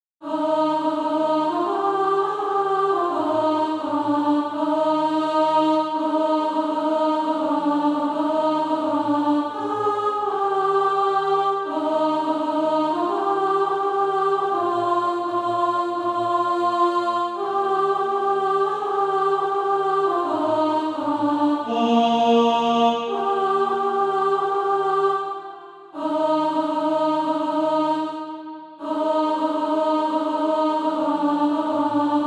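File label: alto, SATB Practise Music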